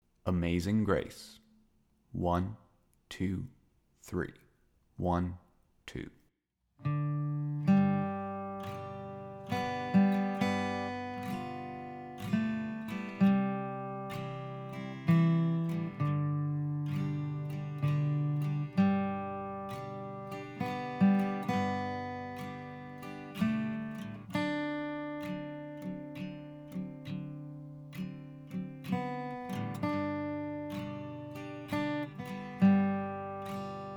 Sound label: Amazing Grace Melody 65BPM, guitar